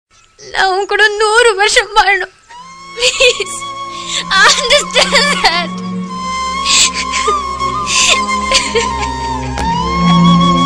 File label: tamil love feel